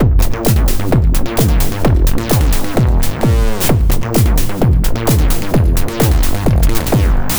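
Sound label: drums acid groove; fabrik pro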